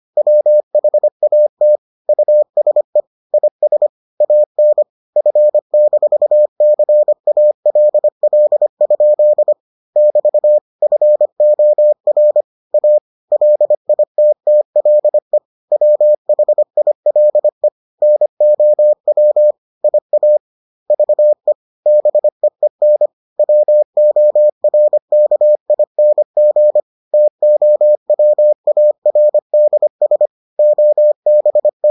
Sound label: morse